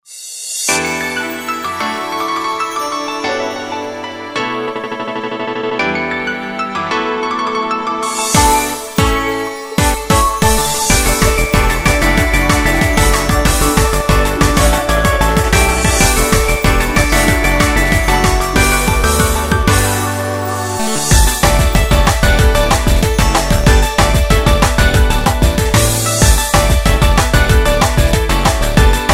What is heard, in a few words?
karaoke; sdvx